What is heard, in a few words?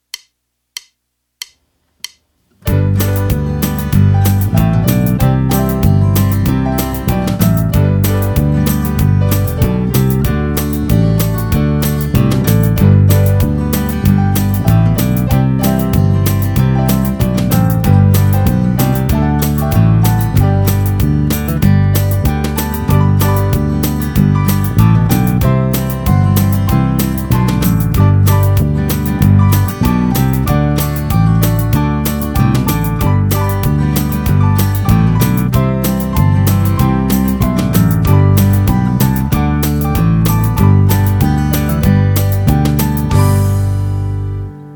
Bass Runs In G Fast